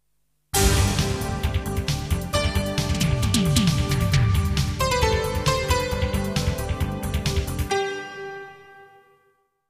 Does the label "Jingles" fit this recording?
Yes